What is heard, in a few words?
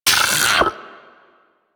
Arachnoid Vocal Pain